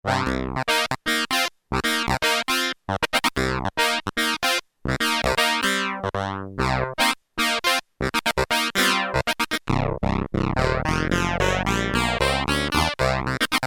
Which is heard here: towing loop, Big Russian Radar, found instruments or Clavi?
Clavi